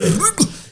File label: M FX FILL, MALENOISE; Spectrasonics; Heart of Africa 1